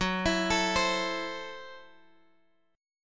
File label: Gb Minor 7th Flat 5th, Guitar Open G